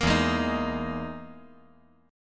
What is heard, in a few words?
E Major 7th Flat 5th